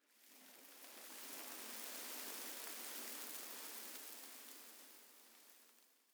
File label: sand gust; ambient; Counter Strike Model Pack; CS:GO; dust2